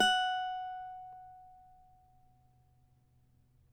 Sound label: CAVAQUINHO F#, Guitars; Spectrasonics; Hans Zimmer Guitars VOL-2